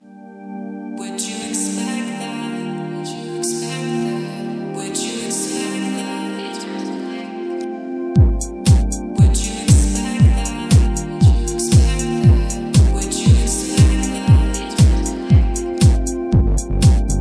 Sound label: disco music I DANCE WHISPERING 432 HZ